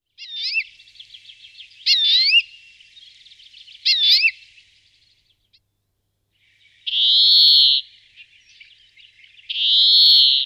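obyknovennaya zelenushka golos, European greenfinch voice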